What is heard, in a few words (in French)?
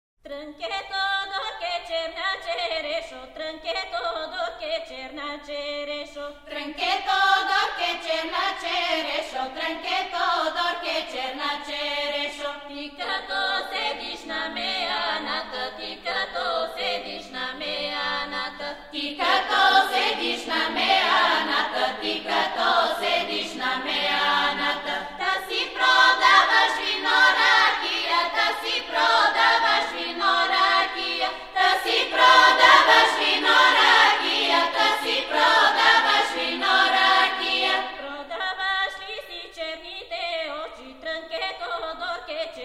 Voix Bulgares